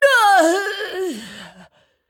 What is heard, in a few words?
Female Dragon Death